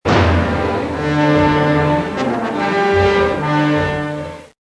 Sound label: Last battle music; Star Wars; Star Wars Misc